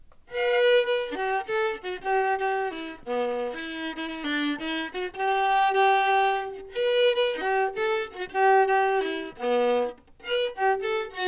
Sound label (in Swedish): stämma fanteguten